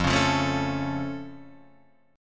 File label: F Augmented